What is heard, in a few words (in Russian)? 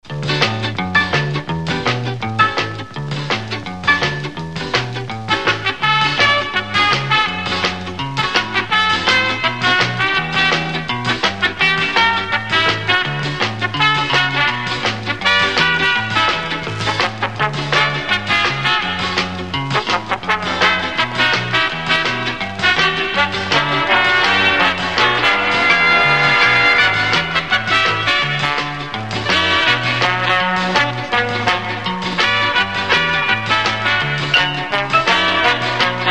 instrumental byistryiy